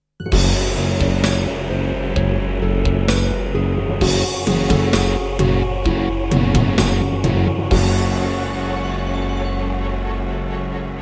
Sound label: Introduction, Roland SC-8820; Doom (Sigil)